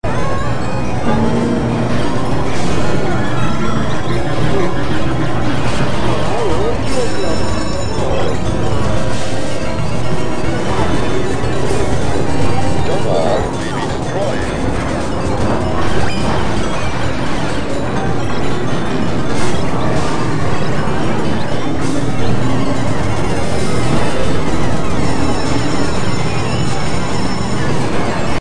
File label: arcade